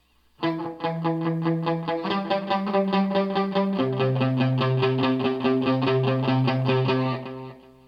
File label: E G C Simple; making riffs